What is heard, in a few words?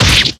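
Katana, battle; Etrian Odyssey I HD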